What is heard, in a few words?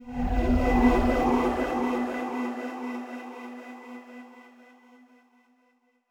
basic Mood